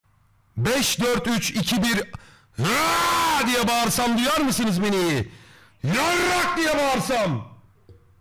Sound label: bass; elraen